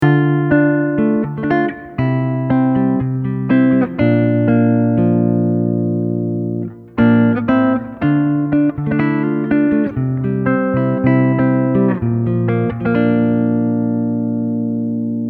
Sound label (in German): Unterschied Dur und Moll